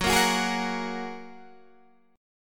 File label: F sharp Major Add 9th, Guitar DADGAD